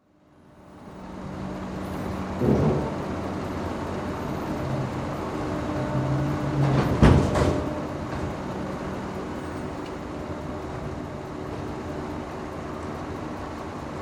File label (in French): port genes alienation portuaire